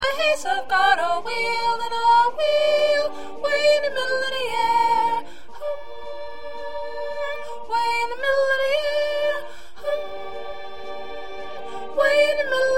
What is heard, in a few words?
2nd Soprano